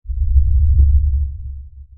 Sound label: sonar Ping Air Medium Beacon Directional; Barotrauma; Real Sonar